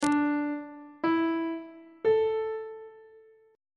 d suspended 2nd triad chord intervals on treble clef